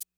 Maraca, 808

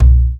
African Perc

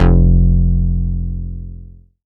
bass F1, Moog Minimoog

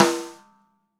Ludwig Black Beauty Snare Rimshot Room; Sonic Foundry; Sony Creative Software; Vital Drums; 1969; Snare One-Shots

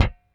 Rickenbacker Bass; Airwindows

